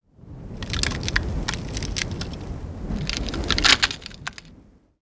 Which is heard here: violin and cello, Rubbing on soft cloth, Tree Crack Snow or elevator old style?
Tree Crack Snow